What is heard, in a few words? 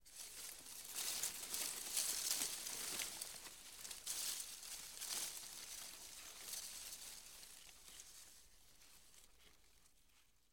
Leaves Rustle Heavy